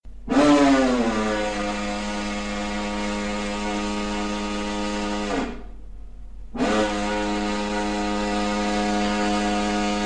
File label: siren ringtone